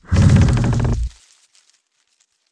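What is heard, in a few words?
Legendary Tree Attack; Seer; GamigoNA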